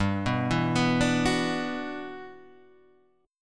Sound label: E Minor 9th G